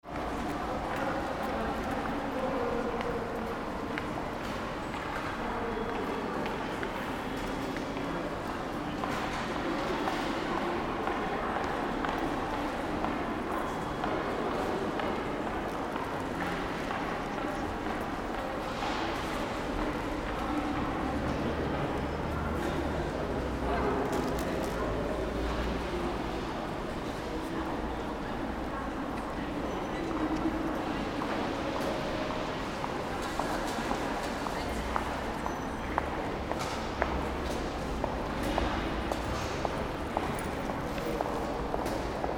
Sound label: railway station entrance hall